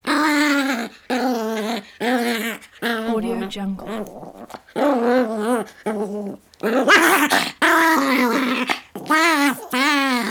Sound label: dog creature pomeranian vocalizations growls